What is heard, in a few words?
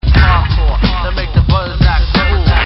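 HARDCORE, BEATS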